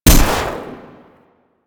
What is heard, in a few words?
Electro Mag Shot, Weapons; Warframe; Corpus